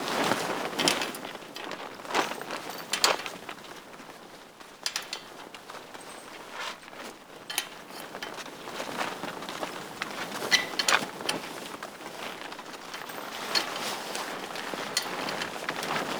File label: Tarp Rustle Loop; Warframe; Ambience; NineteenNinetyNine